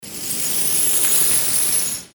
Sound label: metal chain